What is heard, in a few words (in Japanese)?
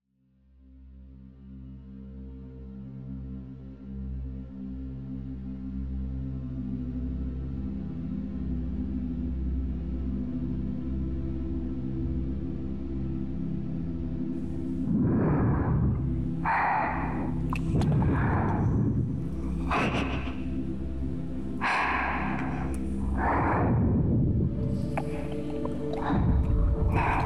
温かい舌と、手の中で（深層耳舐め＆手コキ）; 鼓膜を舐める